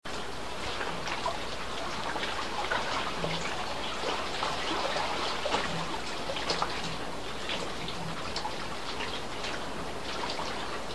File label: waves wind plate creek chickee